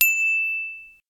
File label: loud bell; i3